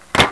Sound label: Thud; Perils of Palermo